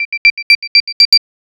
sound anc; Apple iOS-18.1; FindMyDevice; beats

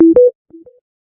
Charging Started, Samsung Galaxy S21

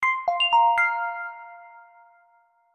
message, notifications; Coolpad; 5860

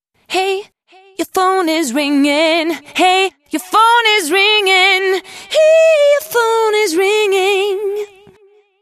Hey Your Phone Is Ringing; Sony Ericsson; ringtones

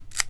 Device unlock; Alcatel Vodafone Smart II

